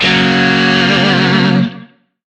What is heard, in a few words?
Shawzin Yo Chord A; Music; DE; Warframe; Lizzie Shawzin